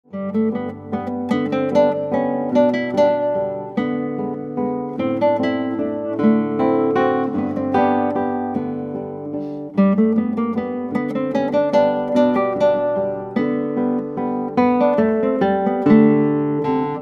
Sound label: Guitar